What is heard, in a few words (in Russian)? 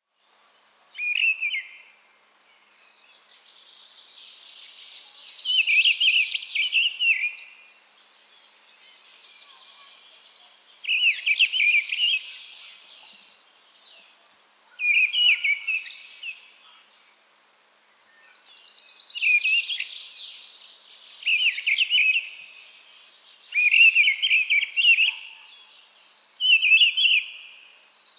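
deryaba golos, mistle thrush voice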